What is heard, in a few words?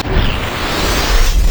charge; LoL; xerath base